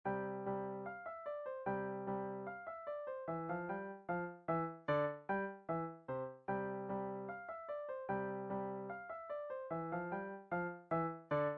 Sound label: Bach musette, piano; classical